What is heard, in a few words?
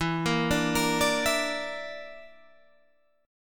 Bb Major Flat 5th E, chord